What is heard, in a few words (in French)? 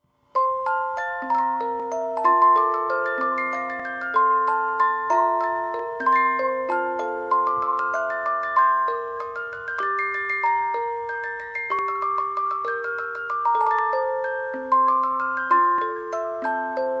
valse des fleurs 30 lames japonais, boites musique